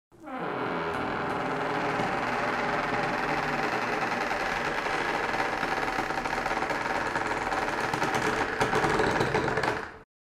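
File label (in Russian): звук двери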